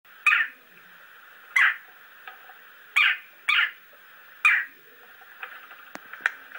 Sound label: crow